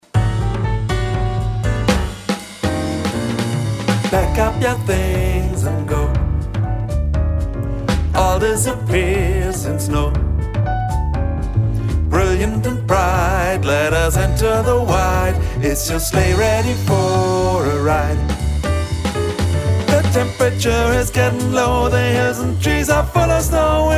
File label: Tenor